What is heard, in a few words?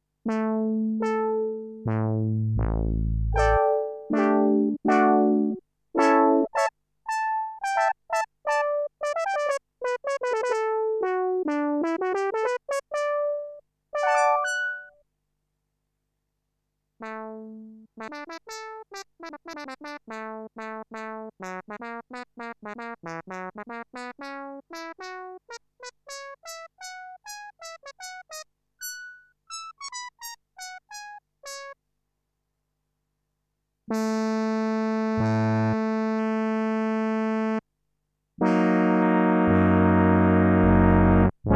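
Analookalike, A collection of traditional sounds based exclusively on the ROMs saw, pulse and PWM waves; Korg 05R/W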